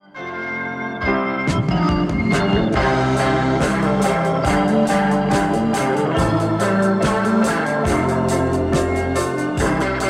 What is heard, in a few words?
leslie fast speed